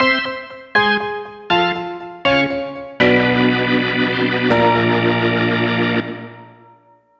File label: Jingle ~ Mini-Game Fail; mario super sluggers; wii